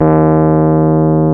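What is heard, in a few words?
piano Rhodes Mk.V; PNO; Roland LCDP10 Keys of the 60s and 70s 2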